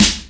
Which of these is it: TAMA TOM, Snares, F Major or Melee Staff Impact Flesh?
Snares